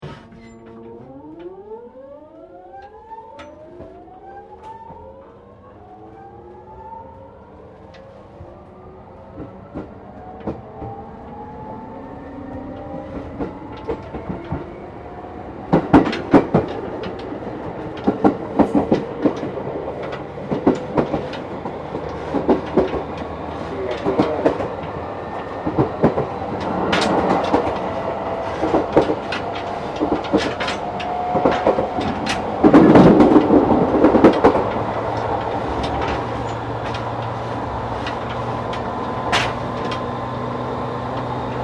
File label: Train Regional Local Connecting Platform Startup, Japanese Trains; Aomori; Vehicles; Train Jr Regional Aomori Local Connecting Platform Startup